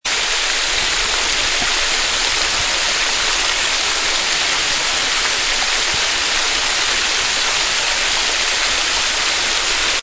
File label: Spruce Glen Falls